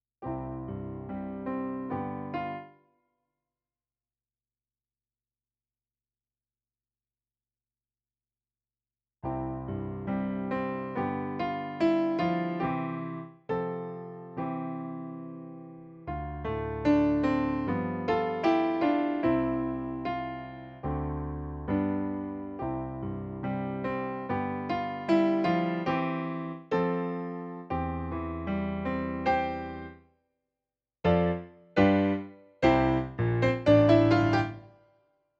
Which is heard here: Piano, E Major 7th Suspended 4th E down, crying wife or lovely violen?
Piano